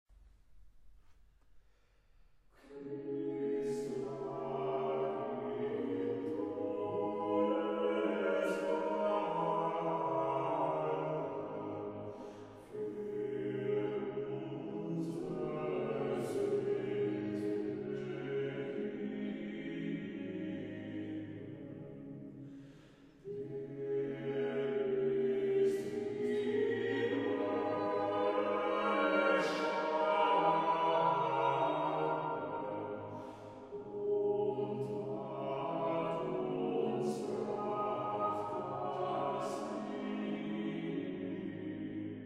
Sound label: Chorale for 4 voices; 'Christ lag in Todesbanden'; BWV 277